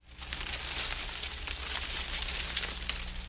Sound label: Brush Crunch Sngl, trees